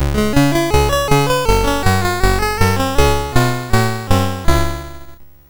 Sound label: MOS6581R2, sid; Rupert and the Ice Castle